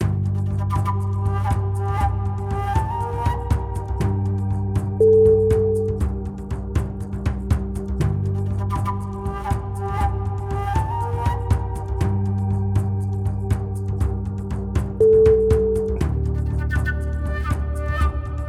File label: tribal jungles